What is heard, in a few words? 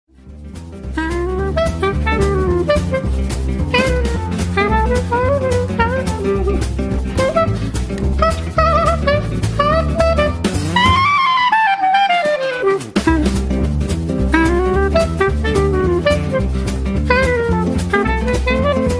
jazz